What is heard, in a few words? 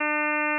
Inh f300 Flat, 300Hz, Inharmonicity